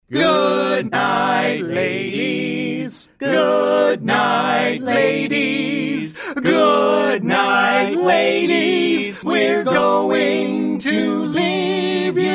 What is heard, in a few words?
Losing Song